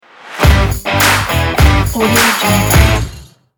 driving rock logo

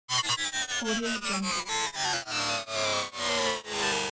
scifi glitchy digital shutdown

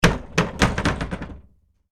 cellar door open with more bounces; hollywoodedge

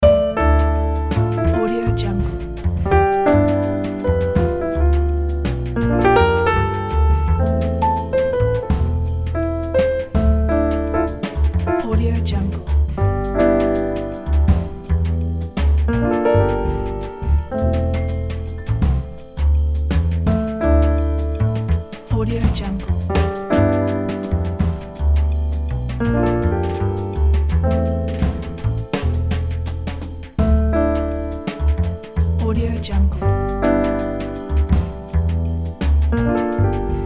jazz piano

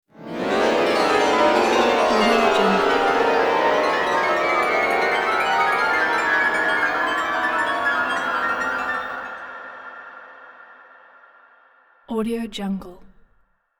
rising cacophony glissando piano logo; hollywoodedge

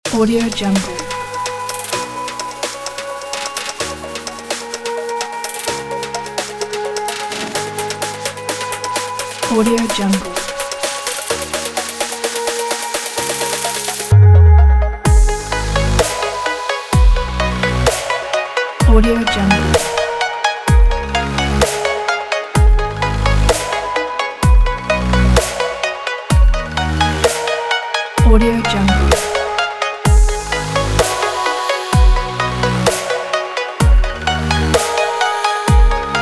future technology dubstep

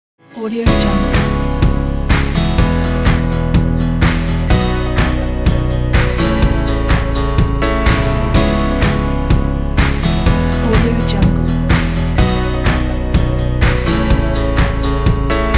pop energy travel timelapse

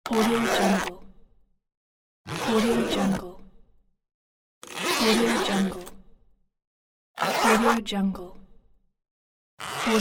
zipper sound effects

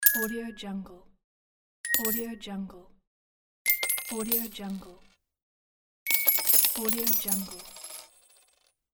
coin xylophone ding

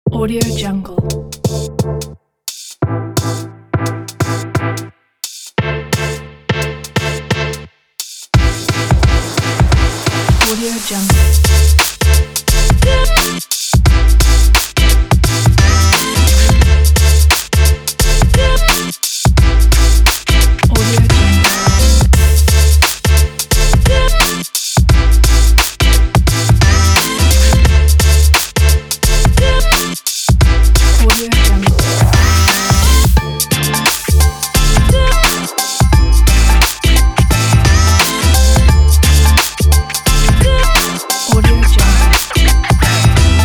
to the hiphop

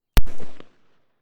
gun live ammunition

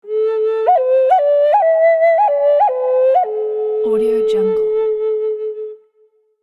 native flute

